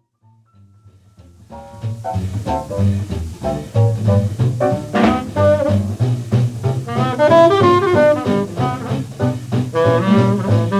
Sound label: solo